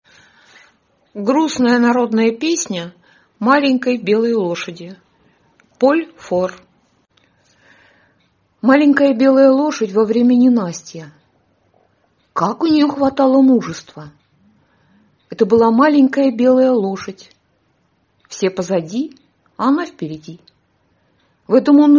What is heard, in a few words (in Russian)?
Грустная народная песня маленькой белой лошади